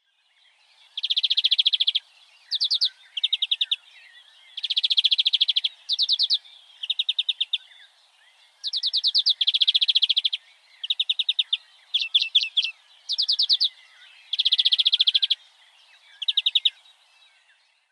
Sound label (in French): verdier d europe